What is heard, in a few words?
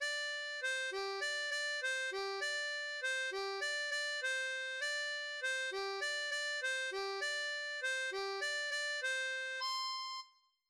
Dansons la capucine, harmonica; Do